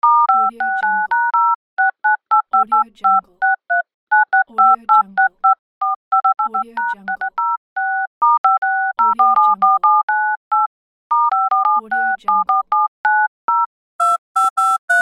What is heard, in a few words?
dialing a number; warpjumper